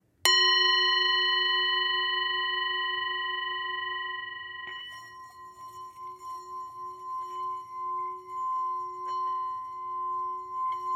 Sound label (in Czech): tibetska misa